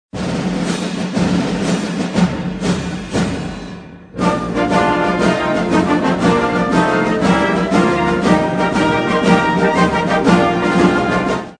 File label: us air force song